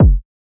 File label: Kick D; Cymatics; Astro Sicko